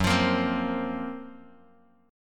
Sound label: Gb Major Sharp 11th, Bass Standard; strummed; 14,20,20,17; JGuitar